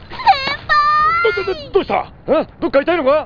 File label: hurt